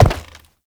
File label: Step Wood; Warframe; Uriel; Movement; Steps